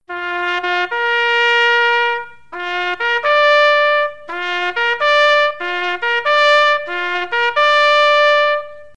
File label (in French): défaite; fortoresse